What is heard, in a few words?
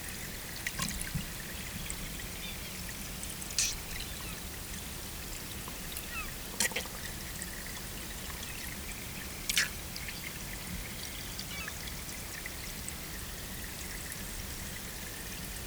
Catastomus commersonii; Stonybrookrun; soniferous